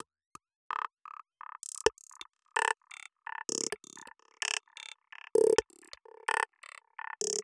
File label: Pure Analog